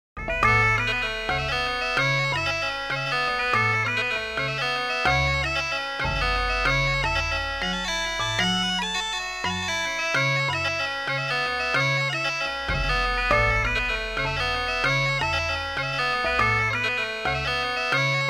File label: bassoon prime harmonics